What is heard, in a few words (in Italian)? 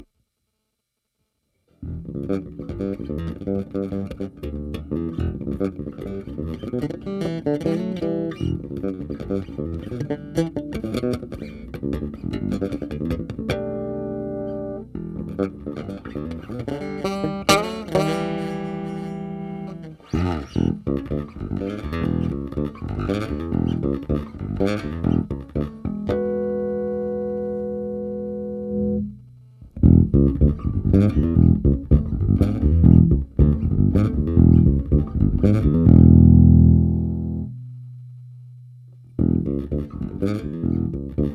intervento elettronica